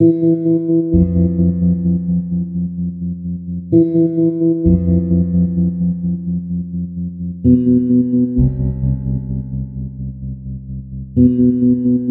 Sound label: Piano